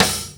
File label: old school dry snare drum sound d sharp key